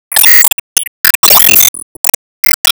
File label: Enemy Poison wizard Apple Absorb